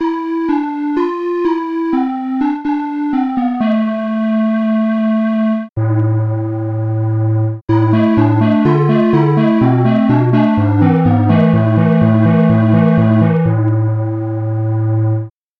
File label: ocarina samples